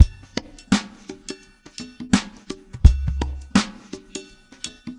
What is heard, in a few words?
LO-FI; Spectrasonics; Liquid Grooves 1 & 2